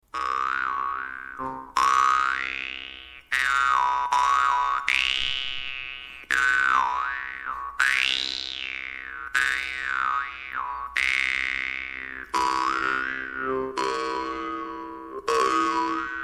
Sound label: doromb; chancelor nova